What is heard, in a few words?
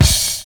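NICE SNARE; Univers Sons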